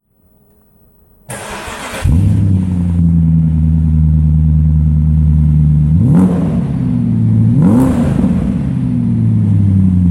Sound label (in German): Wiessmann Roadster